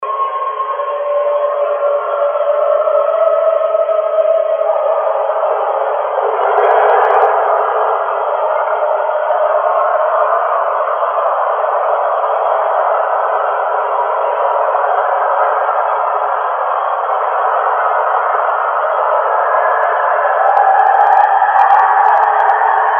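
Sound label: COYOTE PACK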